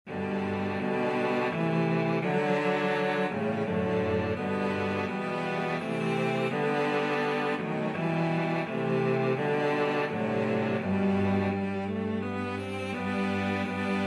cello quartet

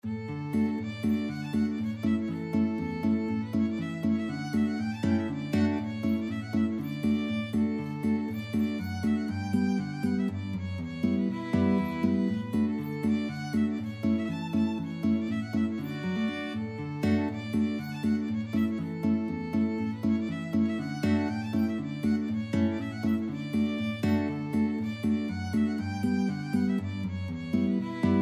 storpolska violin guitar; Bingsjo

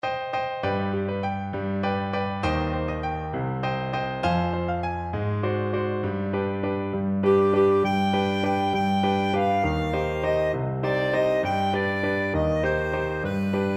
el vito, clarinet

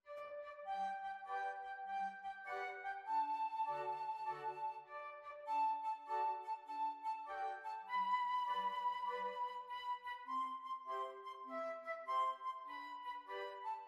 flute choir

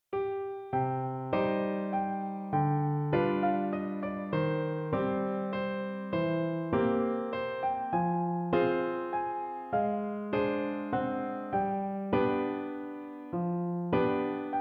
PNO

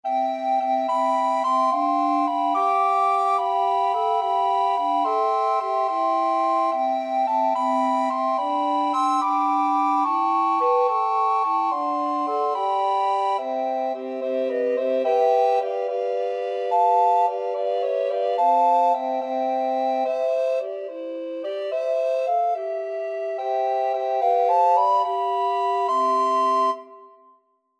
recorder quartet

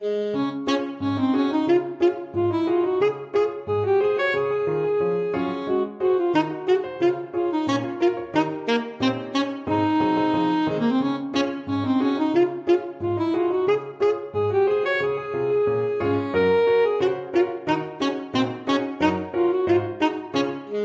ASAX, saxophone

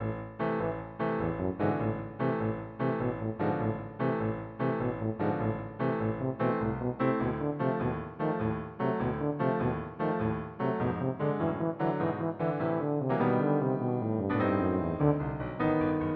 sorcerer apprentice, tuba

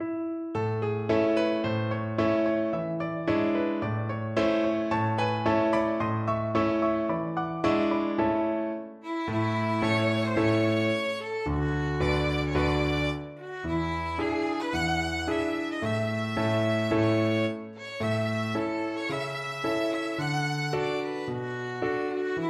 violin

